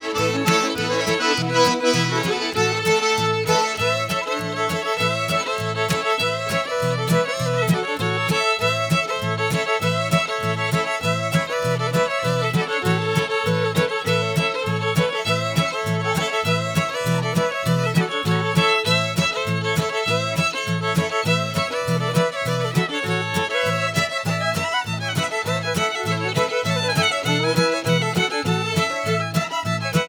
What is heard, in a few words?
creole stomp; loc-musicbox